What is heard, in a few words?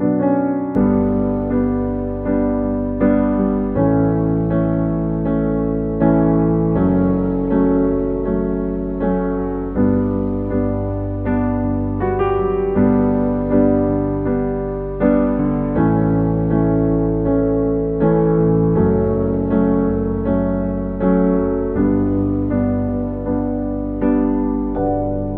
English Ringtone